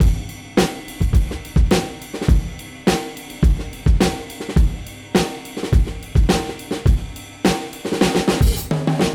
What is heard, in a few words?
105 Beat C Full No Perc